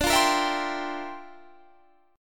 G Minor 13th D

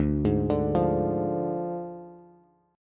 D Diminished D, Bass; arpeggiated

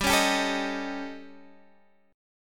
A 7th Flat 5th G

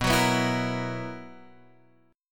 B Major 7th Suspended 4th, guitar chord

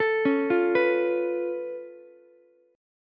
C sharp Augmented 7th, C sharp, down, Ukulele; arpeggiated; C#+7; JGuitar

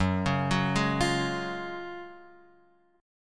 F sharp Major 7th Suspended 2nd, F sharp, chord; F#M7sus2 Chord {2 4 4 1 X 1} arpeggiated

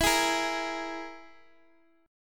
E-Major Flat 5th E

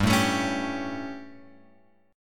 G Suspended 2nd Flat 5th G, chord; Gsus2b5 Chord {3 0 X 2 2 3} strummed

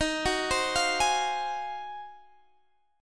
Ab 13th Eb

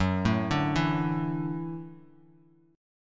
Gb Minor 7th Sharp 5th, Bass Standard; arpeggiated; JGuitar

